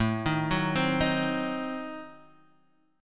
A Diminished 7th A, chord; arpeggiated; JGuitar

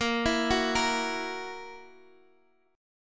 E Suspended 2nd Flat 5th Bb

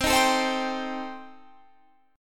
C Minor 6th, chord

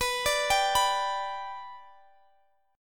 B Minor Sharp 5th, Guitar, DADGAD

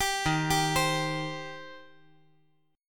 Eb 6th Eb down

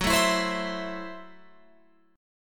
Gb Minor 7th Flat 5th, Guitar Open F; Gbm7b5; strummed

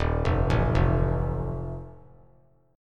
D sharp Suspended 2nd Flat 5th, chord; arpeggiated; JGuitar

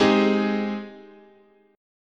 Ab Major 7th, chord, guitar

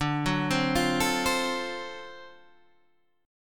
D 7th Sharp 9th, Guitar Open G

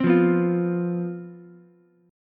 C Major 7th Suspended 4th, down, Ukulele; strummed

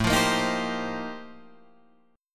F 11th A, chord

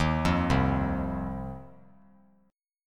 C Minor Double Flat 5th C down 3C 3F 3A 3D; Cmbb5 arpeggiated; JGuitar

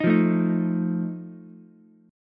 Eb Suspended 2nd Flat 5th Ukulele, Ebsus2b5 chord; Ebsus2b5 strummed; JGuitar

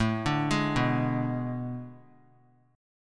A Major 7th Suspended 2nd Suspended 4th; AM7sus2sus4 arpeggiated; 21,21,23,21; JGuitar

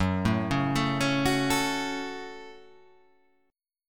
F sharp Minor F sharp, Chord

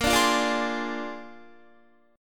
Bb 9th Bb down Guitar 4F,4A,5C,5G,5C,6E; strummed

